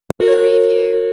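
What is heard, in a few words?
Toy Sound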